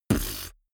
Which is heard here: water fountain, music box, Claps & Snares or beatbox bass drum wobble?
beatbox bass drum wobble